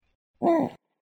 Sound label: hoot, owl